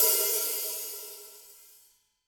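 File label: open hi hat, Real Drum Kit, FUNK KIT; Best Service ProSamples vol.43 - Real Drum Kits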